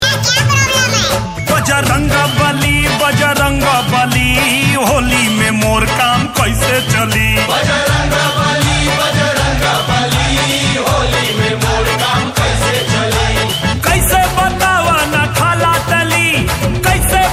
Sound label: Holi song